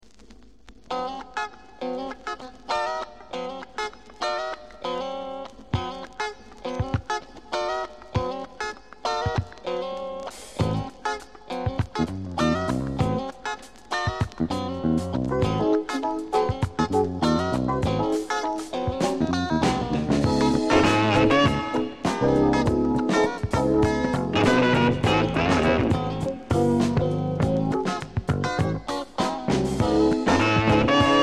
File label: Funk